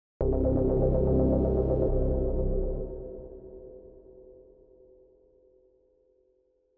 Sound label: Bass Stab